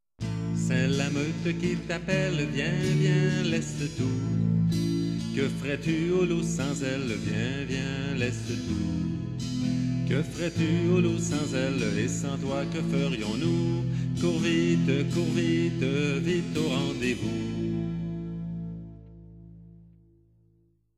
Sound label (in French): chant de rassemblement